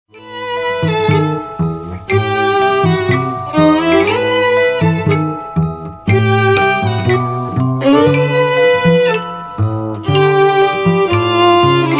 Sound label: mecano tango